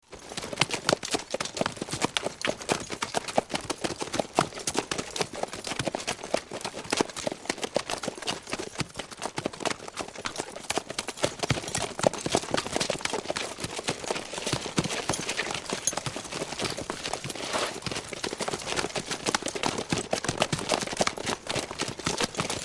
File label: Horses Steady Horses